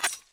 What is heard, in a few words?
p atk stab